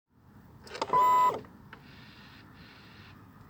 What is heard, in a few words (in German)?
Einschalten; f650